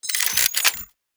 Weapon Reload

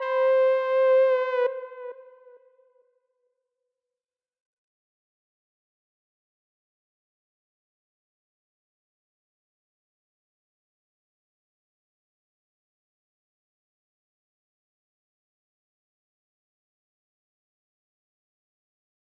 Breakout Doom Mix Stem Layer; Breakout Recharged

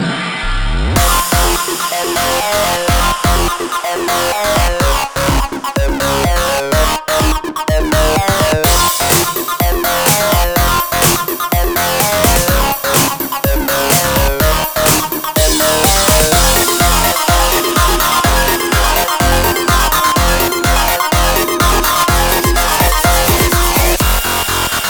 Taxi Chaotic (Instrumental); Friday Night Funkin' Vs. Neco Arc

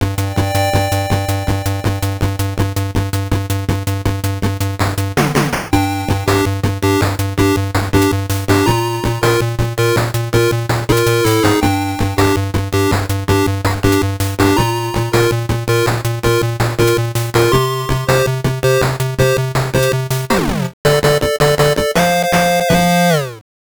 Ending; king of fighters r 1 neo geo pocket

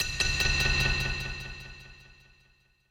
BGM stinger, BGM Player Dying Out; Babylon's Fall

